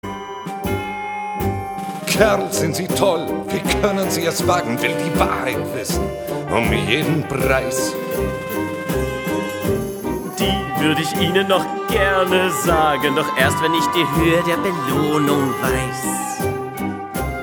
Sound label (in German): duett; bumble und brownlow